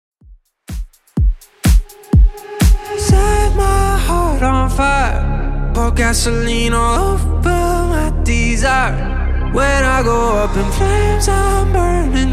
Top40 Redrum 125bpm

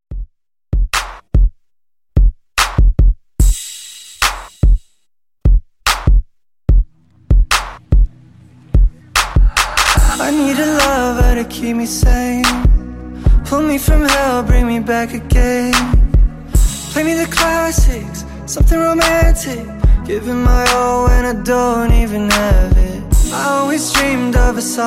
Slow Jam Re-Drum; remixplanet

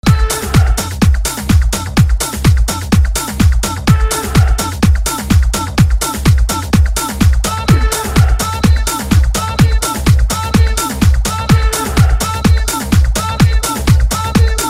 Latino remix

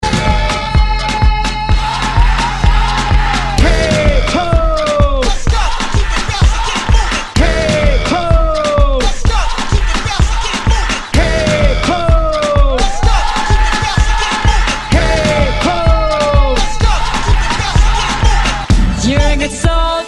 20s Hype ReDrum 127 Bpm

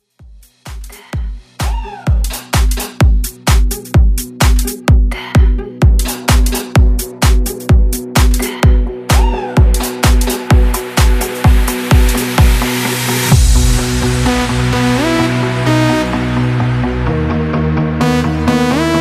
Bass House

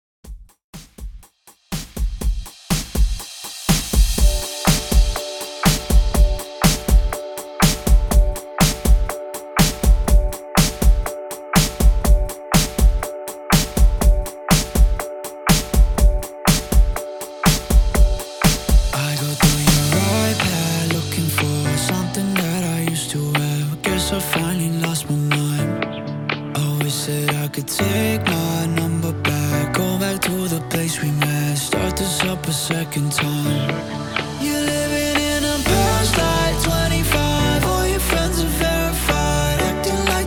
REDRUM 122 BPM

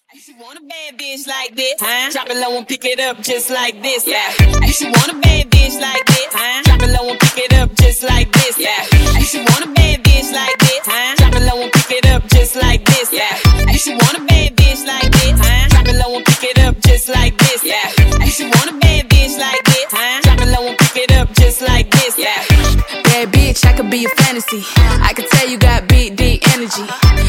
Acapella Intro Outro 106 Bpm

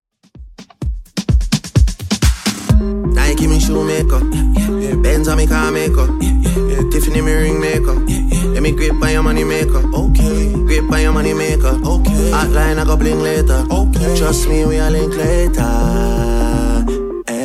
Top40 Redrum 128bpm

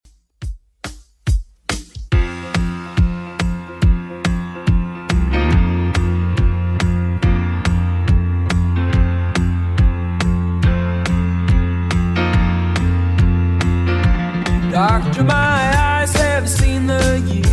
70s Redrum 141 bpm

